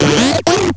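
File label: upgrade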